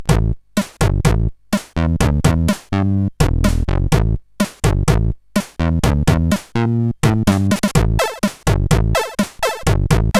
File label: Simple Loop, CSG8580; CSG8580R5